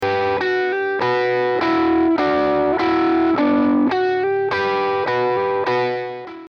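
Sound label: electric guitar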